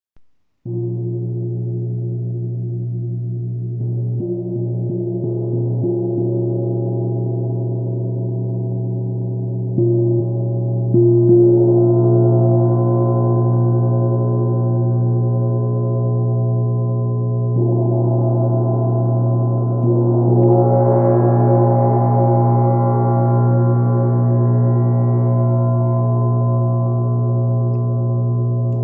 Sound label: Nepali tamtam